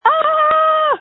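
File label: Scream, phone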